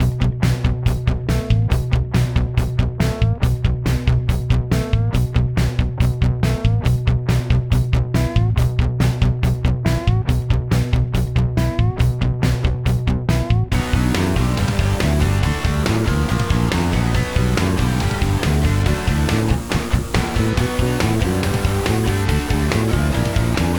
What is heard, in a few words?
Ask Countdown Music; Jackbox Games; The Jackbox Party Pack 1; YDKJ